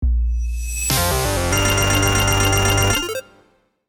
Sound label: Slot machine casino bonus round end